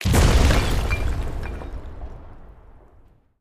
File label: sym Explosion; elements